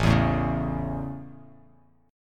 A Major, Bass Standard; strummed

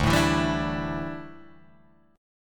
D Suspended 2nd Suspended 4th, Guitar, Open G

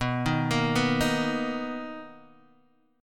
B Major 7th B down Guitar DADGAD

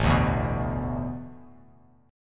A Suspended 2nd Suspended 4th; Asus2sus4 strummed

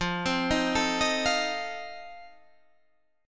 F 7th F down; arpeggiated

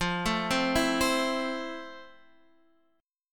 F Major 7th F; arpeggiated

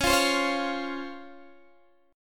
C sharp Major 7th C sharp Guitar DADGAD

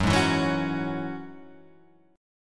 F sharp 7th Suspended 2nd F sharp down

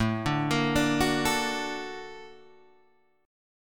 A sharp Augmented Major 7th A, guitar

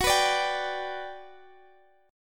G Major F sharp, chord

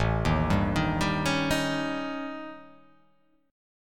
A 7th Flat 5th

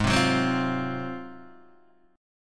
Ab 13th down Guitar Open D

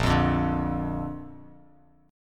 Bb Major Bb down Bass Standard, chord; strummed; JGuitar

